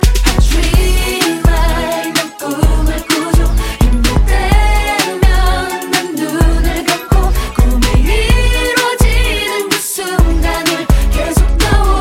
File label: chorus